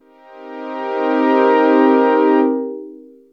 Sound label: Strings, dx7